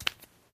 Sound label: bare step; Golden Crayon Sounds